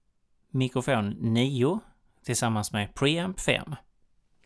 mic pre

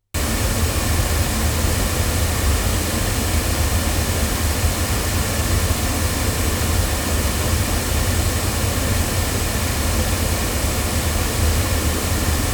loudspeakers, microphones

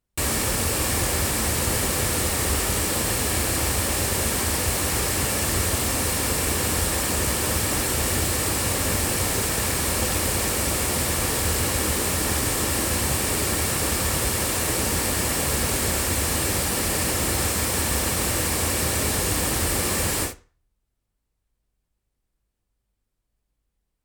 sE 2A cardioid loudspeakers, microphones; se electronics

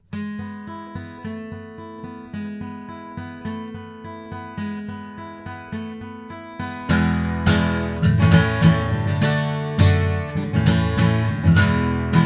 MXL R144 guitar

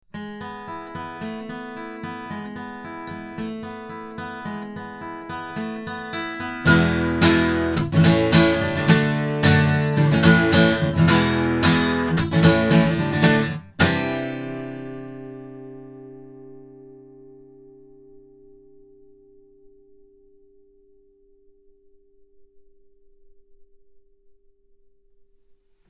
omni guitar; Reactor; BLUE

